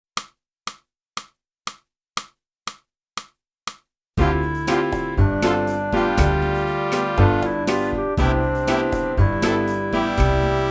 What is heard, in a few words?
flute; boosey